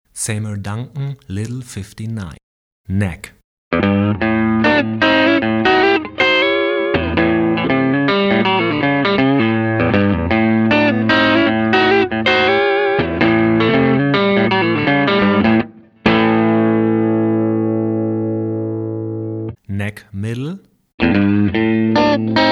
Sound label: Seymour Duncan Little 59, Humbucker Pickups für Strat und Tele